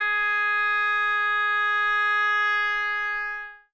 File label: oboe; Notes; Winds